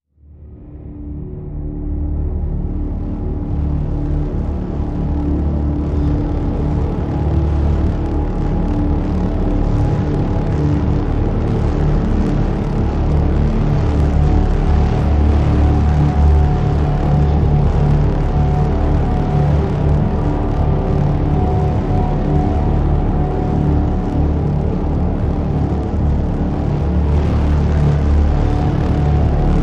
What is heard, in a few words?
Drn Agoraphobic D